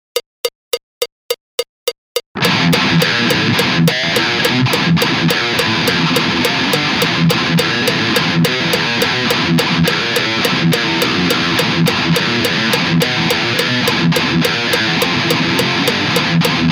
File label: thrash